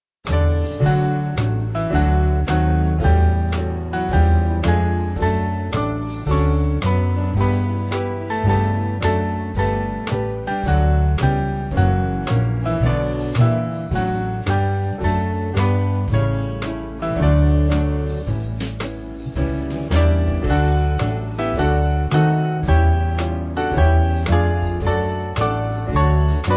Band, Music Backing Files for Churches; I Heard The Bells; Waltham

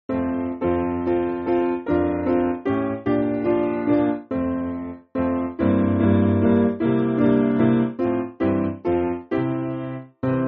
Piano; So Precious Is Jesus

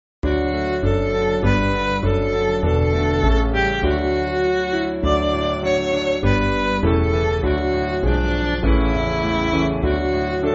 Midi, Music Backing Files for Churches

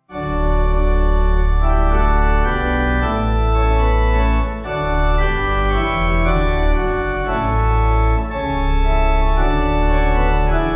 Pipe; Let All the World; Universal

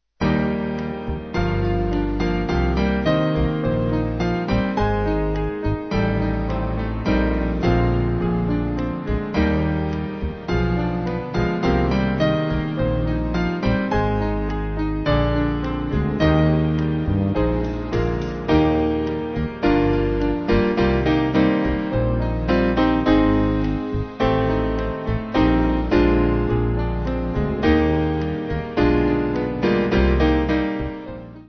Band; Small Band; 4/Eb-E

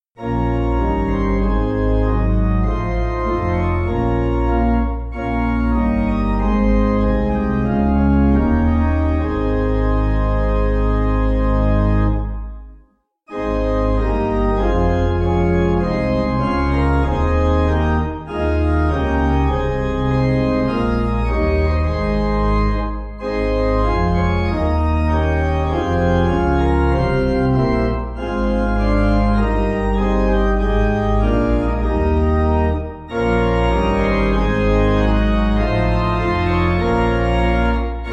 Lead Us Heavenly, Feniton Court, Pipe Organ

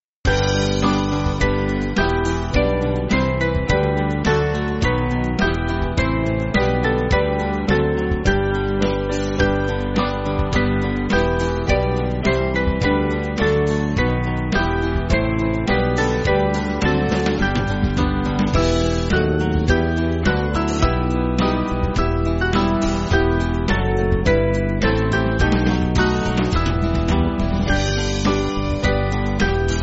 Band

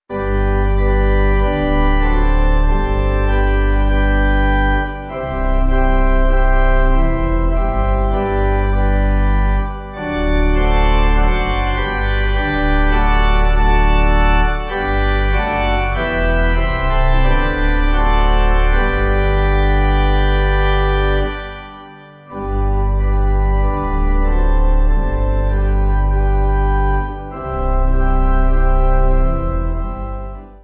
Pipe, Pipe Organ; Sabbatsdag 7.7.7.7

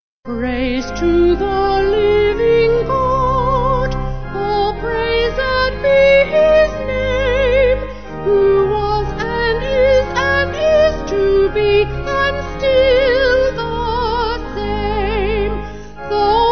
Vocal Organ

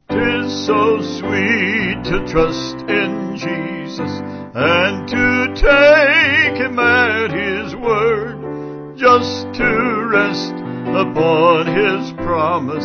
Vocals Piano

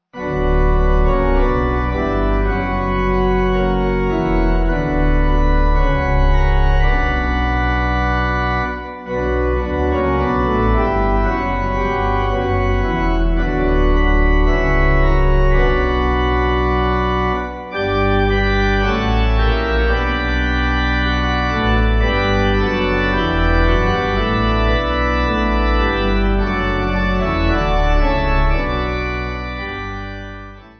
Pipe; Christian Dost Thou See; Sohren

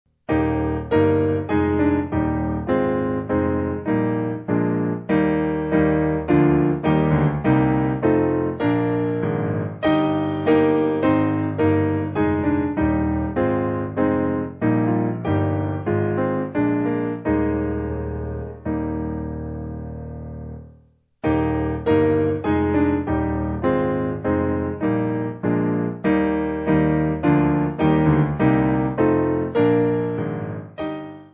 Piano

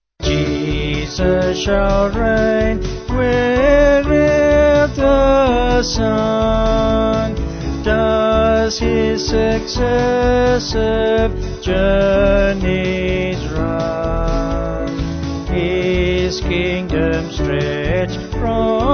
Vocals Band

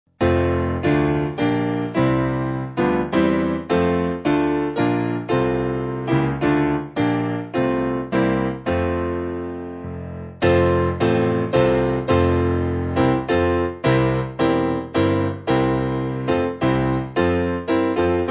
Piano

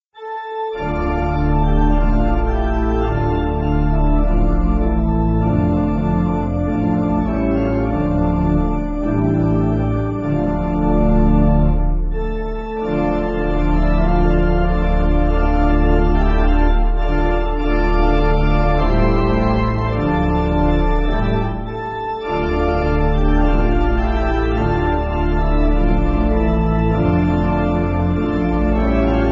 Shalom My Friends, Salom Chaverim, Round, Pipe

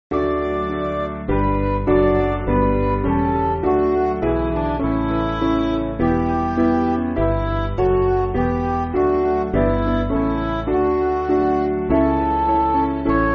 Eternal Glory Of The Sky, Richard, Midi

